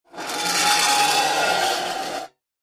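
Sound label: Metal Scrape On Cement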